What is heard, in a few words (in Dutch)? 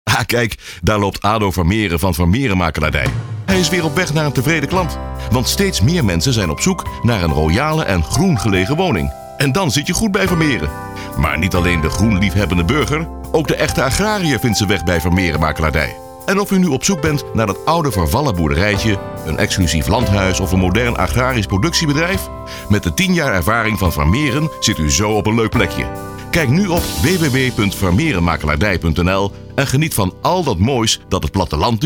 radiojingle